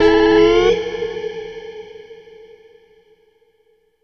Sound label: Ambient Tone